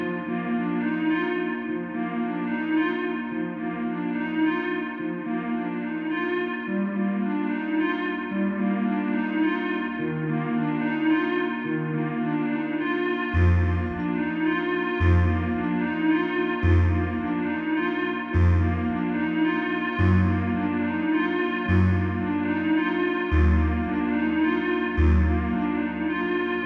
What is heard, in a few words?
Loop 108bpm; Oprever; jenny leclue detectiv